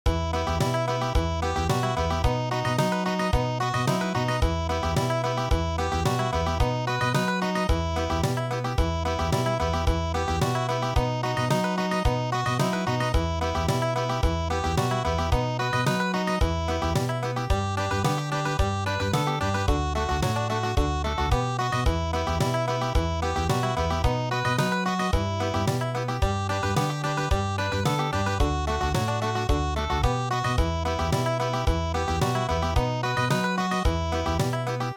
Country, traditional

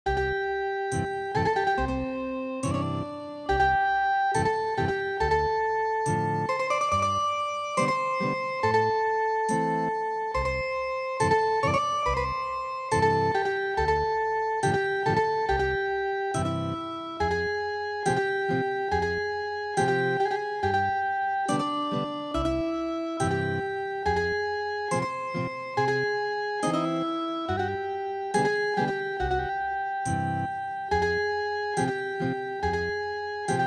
Ethnic Guitar

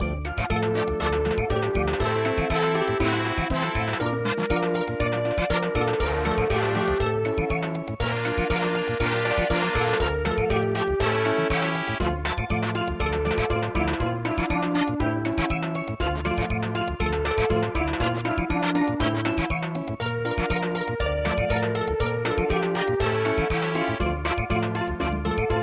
touri samba

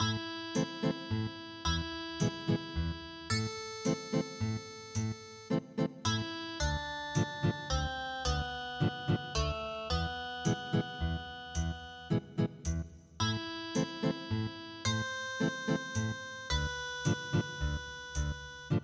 Ethnic Guitar

